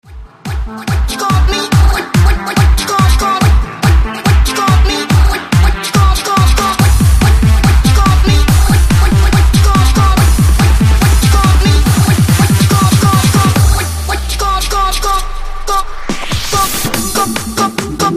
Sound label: BASS Funky